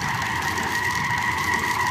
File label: Screech Loop Grass